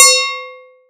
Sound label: temple bell